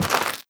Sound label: step light, footsteps, gravel; player